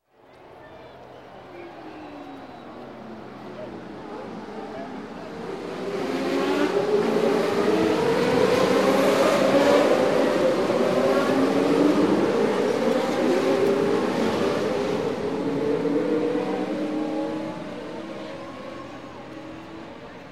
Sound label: Indy Style Race; Sound Ideas; Series 2000